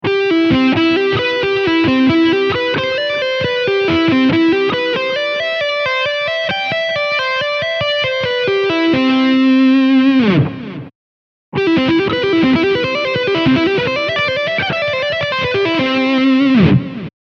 Do arpeggio, guitar

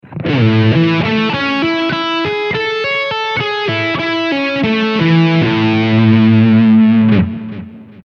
La maj9, guitar; diteggiatura 1

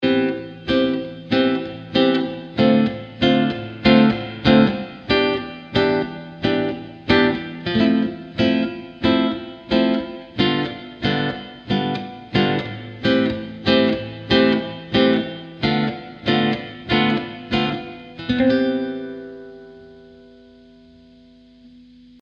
Esercizio, Chitarra sola, pratichiamo con l'accompagnamento; LA TECNICA DELLE MANI; Esercizio 1; Chitarra sola 56; Silvio Barisone

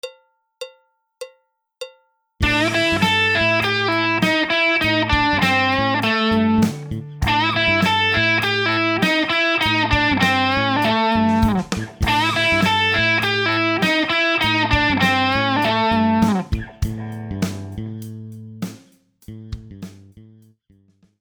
Esercizio, guitar

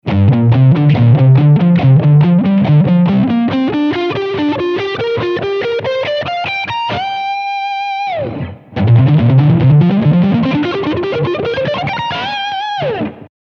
Pentatonica Orizzontale

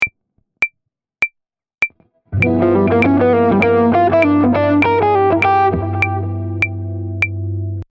Lick 1, SCALA DIMINUITA SEMITONO-TONO lick, Jazz fusion; SCALA DIMINUITA SEMITONO-TONO lick utili; Tommaso Costa

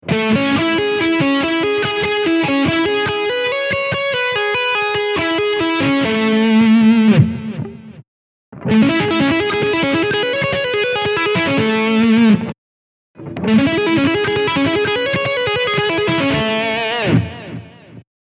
La arpeggio, guitar

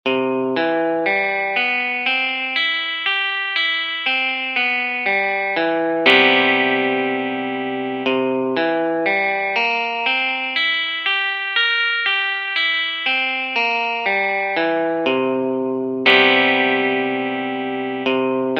arpeggi in Do; Guitar Club Febbraio 2026

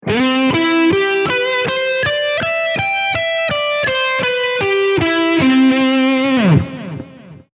Do maj9, guitar

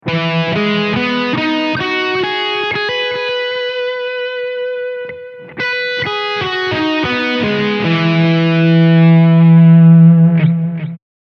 Mi maj9, guitar; diteggiatura 1

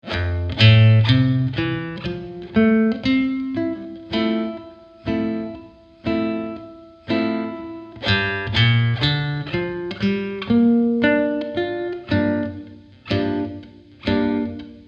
Esercizio in Fa Maggiore

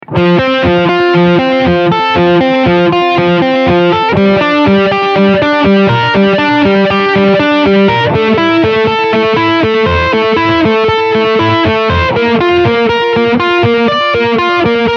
accordi su scala Fa ascendente

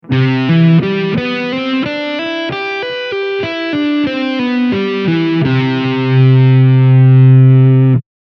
Do maj9, guitar; diteggiatura 1

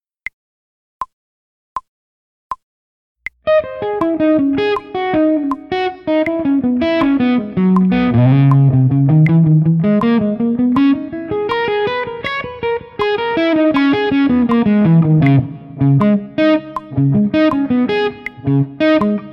ritornello 80 bpm, guitar

